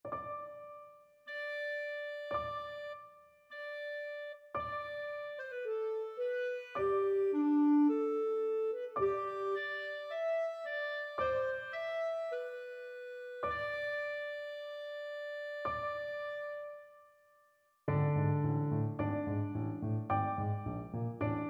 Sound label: clarinet; steppes